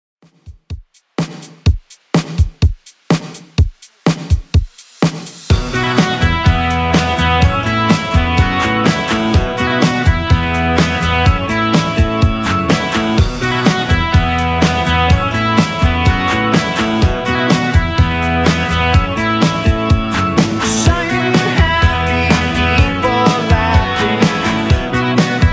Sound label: 90s Rock Redrum 125bpm